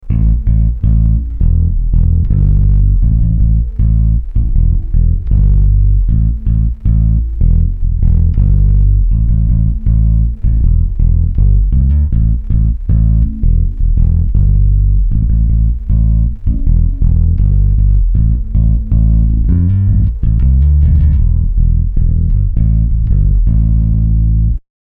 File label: Ampeg SVTR